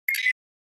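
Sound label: Flash Beep Electronic Tap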